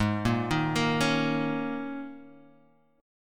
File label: Ab Suspended 2nd Suspended 4th chord; 4,1,1,3,2,x; arpeggiated; JGuitar